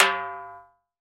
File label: Timbales Paila, Roland BK-5; Percussion